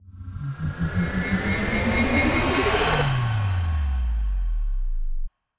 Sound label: burst acceleration; rifts; Stellaris; astral planes